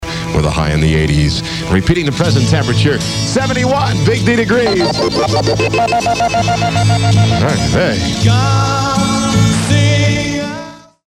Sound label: Hager wx tones